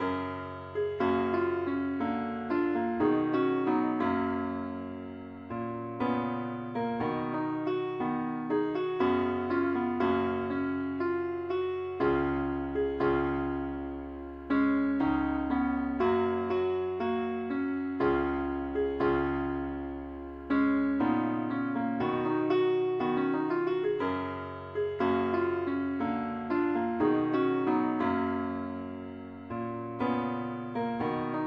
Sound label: keyboard only, key ges; pilot